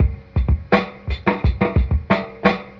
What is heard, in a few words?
Drum loops, Boombap